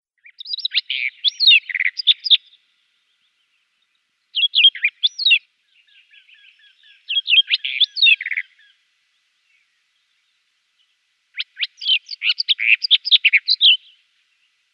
Barred Warbler song

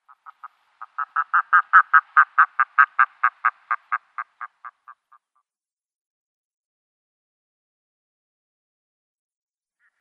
Red-throated Diver flight calls

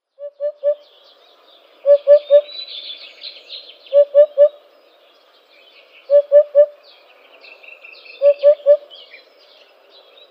Eurasian Hoopoe display

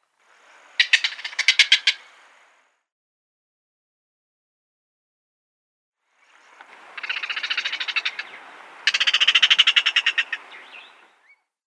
Striated Heron calls

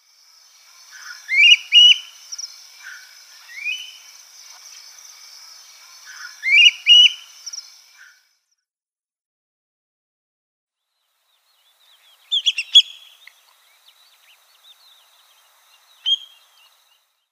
Senegal Thick-knee calls

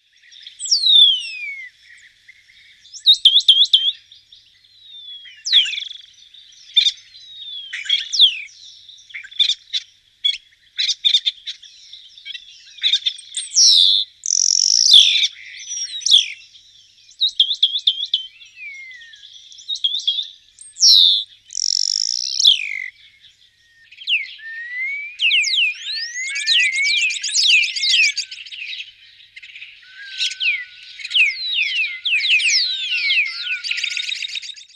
Spotless Starling song

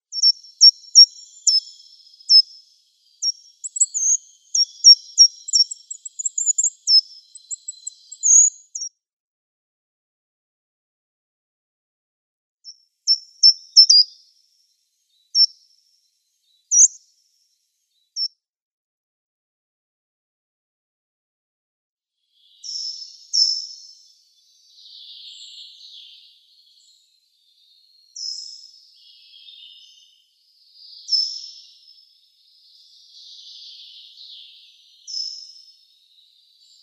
Short-toed Treecreeper calls

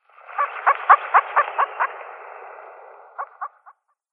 Black Grouse female

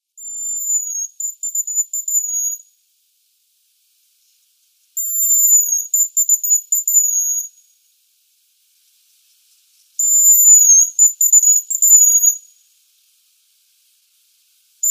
Hazel Grouse male display

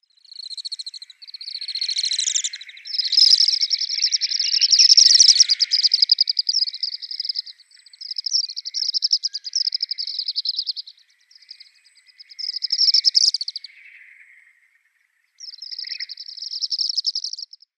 African Palm Swift

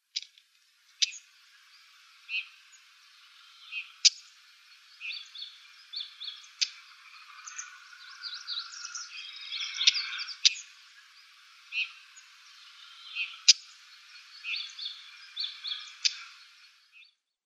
Siberian Stonechat calls

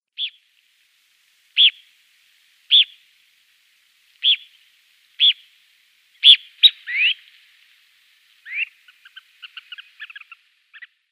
Grey Phalarope calls

